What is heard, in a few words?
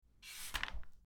Flipping pages